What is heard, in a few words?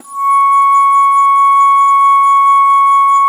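C#3, bass flute; Xsample VOL-2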